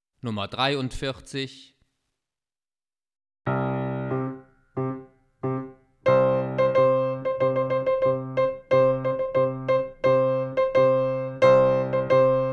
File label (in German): Rhythmen